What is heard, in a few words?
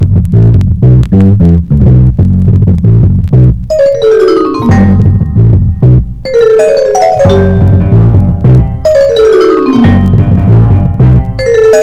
bongos and sound